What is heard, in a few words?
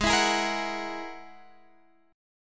G sharp 7th Suspended 2nd Sharp 5th, Guitar, Drop D; x,11,14,13,11,14; down; chord shape